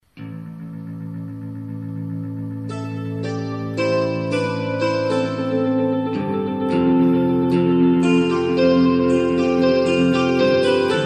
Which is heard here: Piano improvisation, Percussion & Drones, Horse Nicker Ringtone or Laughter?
Piano improvisation